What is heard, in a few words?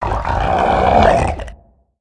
Tentacle Monster Growl; destructibles